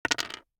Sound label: DICE on Hard Wood, Throw and Roll, Standard, 2 Two Dice; Samples; ArticulatedSounds; DICE Sfx Party (Extended)